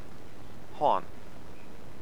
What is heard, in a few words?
horn